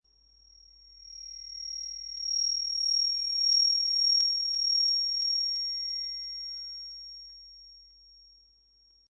Migraine, Sci-fi, high pitched, ear pearcing headache sound; Intruding. Also, sci-fi scanner or laser scalpel